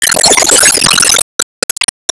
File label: Enemy Masquerade Active Hit; disney star smash android ios mobile gamerip 2020